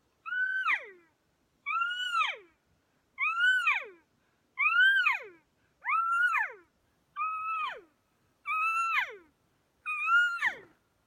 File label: Calls Lost Cow, elk